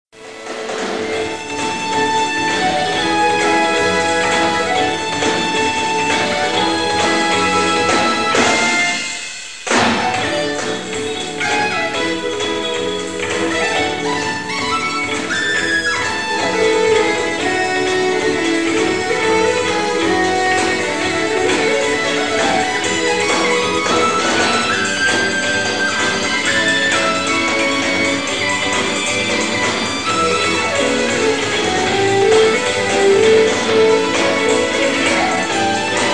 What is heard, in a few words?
keys; keyboards; live cut, senior recital, Univ. of Cincinnati, College-Conservatory of Music, 1988